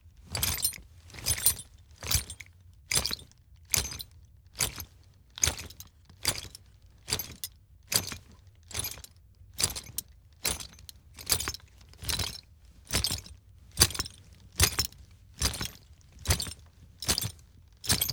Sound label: Tackle Heavy Movement Scrape Long, Creature Footstep and Movement; Joshua Reinhardt; Ultimate Creature Footstep and Movement Library